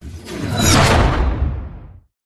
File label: whoosh; Audio Mangler